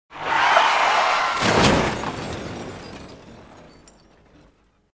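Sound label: brake and crash variant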